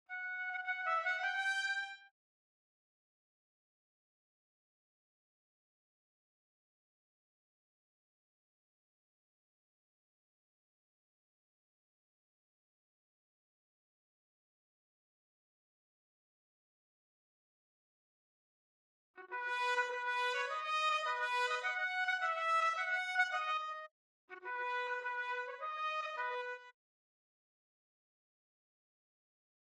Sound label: Trumpet